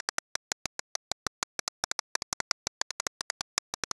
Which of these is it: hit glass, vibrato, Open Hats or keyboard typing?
keyboard typing